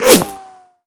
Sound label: bolt fly, crossbow